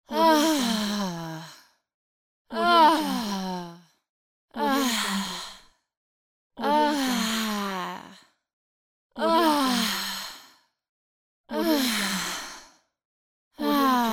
woman relief or pleasure sigh pack

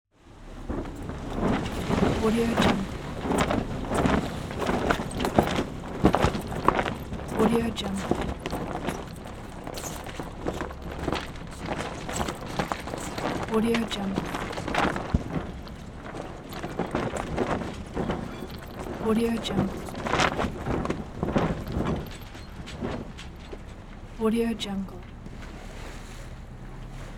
sailboat jib flapping; applehillstudios

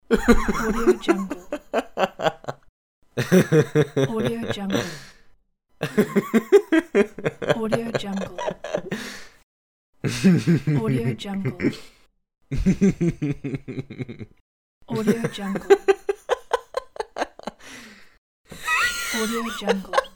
man laughing

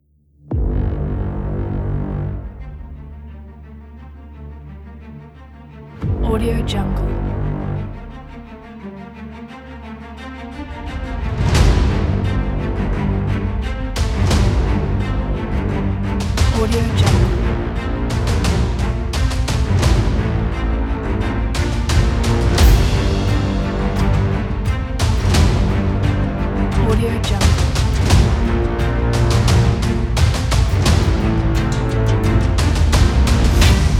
intense action trailer; soundbay

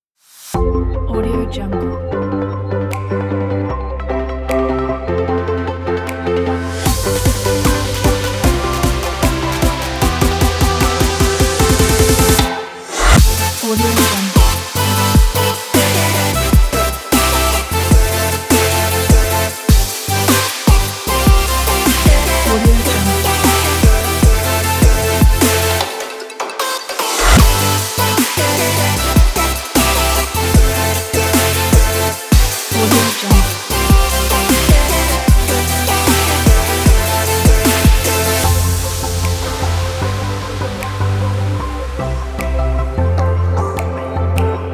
joyful future bass

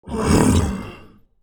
ogre

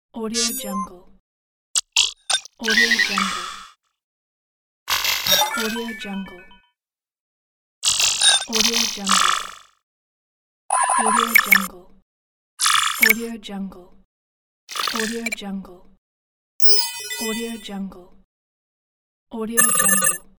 digital

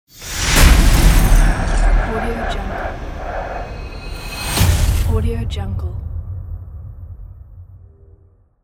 logo impact

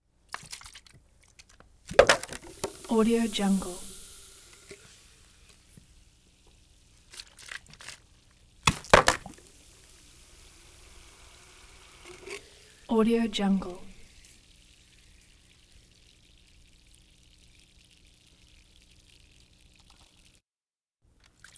beer can drop on concrete fizz some shakes; prosoundfx

